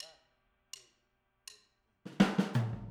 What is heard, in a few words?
Boogaloo - Overhead ST, 82 BPM; Drums; Intro; Sonic Foundry; Drums from the Big Room The Mixes